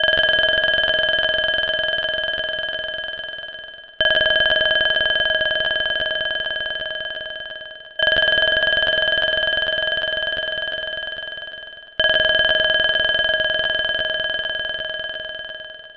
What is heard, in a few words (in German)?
Klingelton